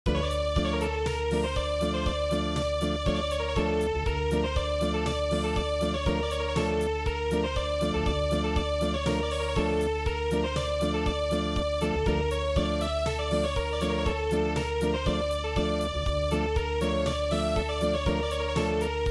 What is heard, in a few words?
Acoustic; Enzeli